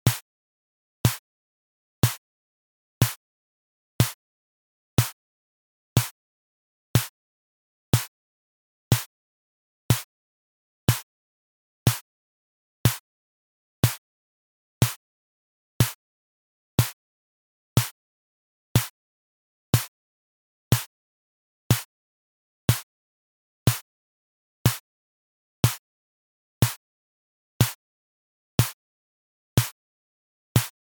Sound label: 122 BPM Snare, drum; mmb drum arsenal vol 1